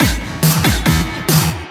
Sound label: Breakbeat; Vengeance Samples; VEC2 Loops